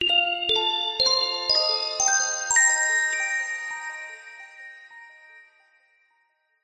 fantasy magical musical accent bright chimes ascending; zapsplat